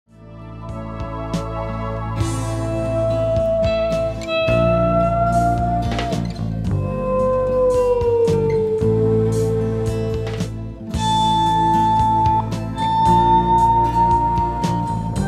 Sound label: Electric Guitar Solo